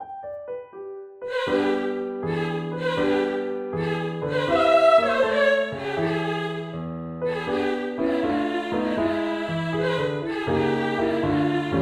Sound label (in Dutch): simulatie zang piano